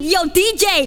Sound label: VOX GENERAL; Megabass Remix! 2